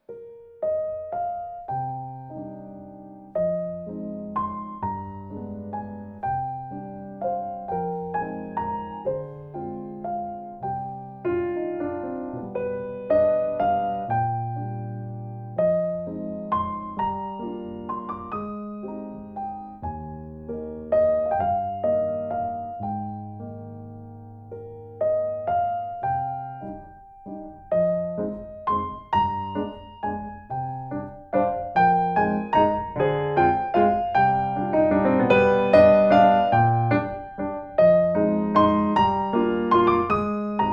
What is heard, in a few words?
Waltz; Pony Music Archive 22.10.01; Ponytown